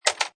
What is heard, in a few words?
Pinball sound, metal clank with return; Video Games